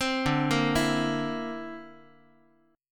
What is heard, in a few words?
D 7th Suspended 2nd Sharp 5th D, Guitar; D7sus2#5 arpeggiated